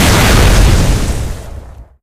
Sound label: giant rocket explode; video games; source engine; tf; mvm